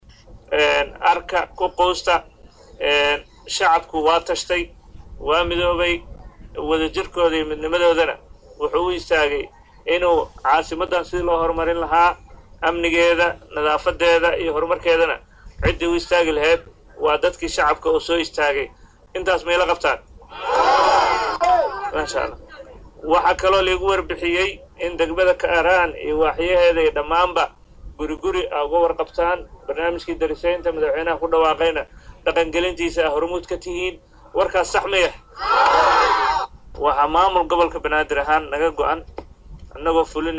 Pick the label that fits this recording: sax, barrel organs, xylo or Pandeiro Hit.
sax